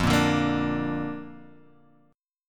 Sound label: D Minor Sharp 5th F